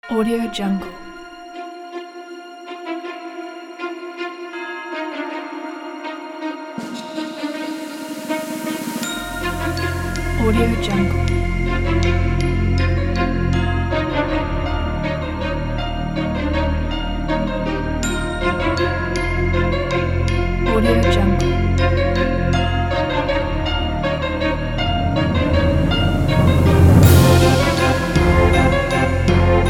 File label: creepy circus